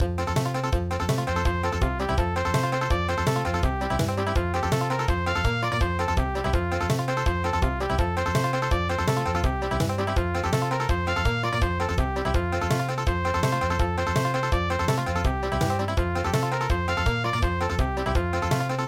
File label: Banjo country; midi; traditional